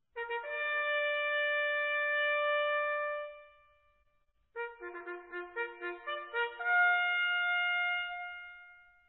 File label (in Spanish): llamada oficiales, corneta